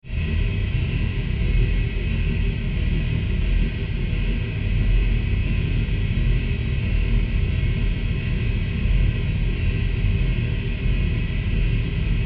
Drone Tonal Industrial Factory Pulsing Rumble Mechanical; Mechanical Atmosphere; Metallic Texture; Sci-Fi